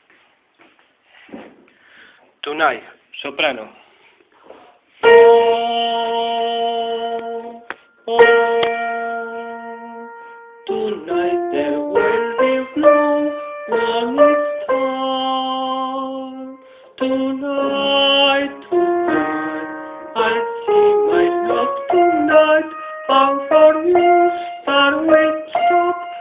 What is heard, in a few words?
soprano